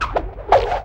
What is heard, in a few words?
FX Dance